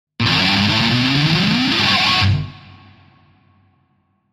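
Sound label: Electric Guitar Plectrum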